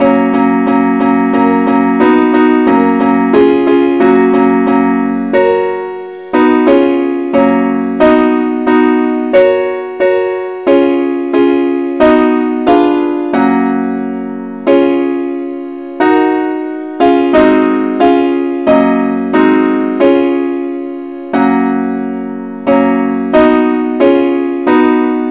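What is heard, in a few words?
simple accompaniment, key f sharp; christe Sanctorum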